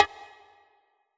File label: Roland SC-88 High Bongo